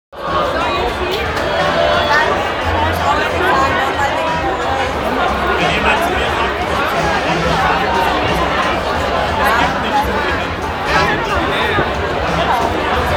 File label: oktoberfest hall; octoberfest